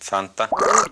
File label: gurp